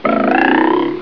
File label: spring; David Bowie Erection Sound Effect; Mark Radcliffe and Lard